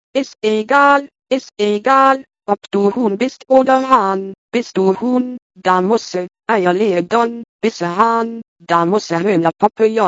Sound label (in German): huhn hahn